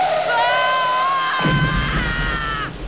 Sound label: scream; japanese